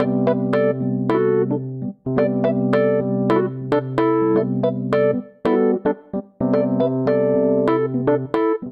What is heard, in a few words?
Vamp Groove & Melody C Lydian 110 bpm, Electric Keyboards; Ableton; Factory Packs